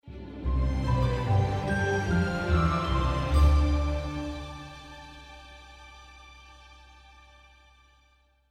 Magic Reveal; LittleBigPlanet 3; PlayStation 3

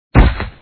Ground; Dad 'n Me

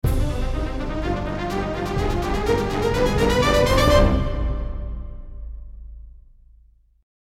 Stinger- Combat Victory; Wizardry 8

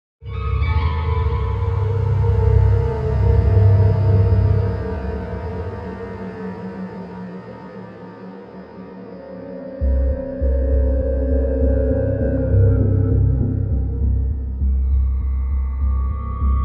Hunt - Ambient

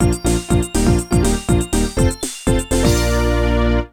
Level Complete; Pilot Kids; arcade

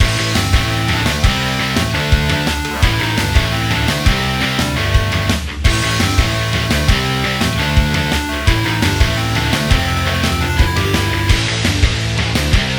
3d Music

